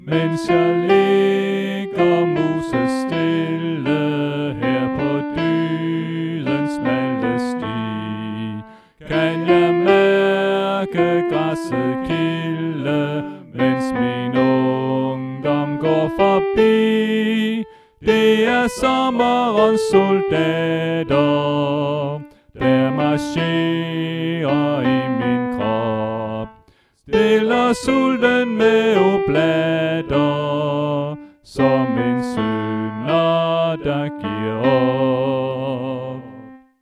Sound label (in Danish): Sopran; oevefiler